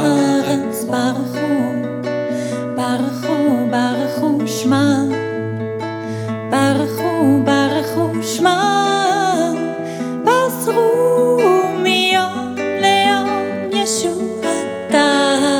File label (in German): hoerprobe; Barechu